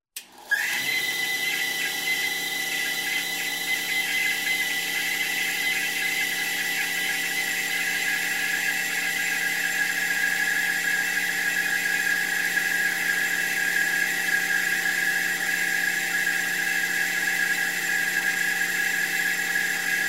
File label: traffic